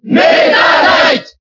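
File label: Cheer French, crowd; Meta Knight; SSBB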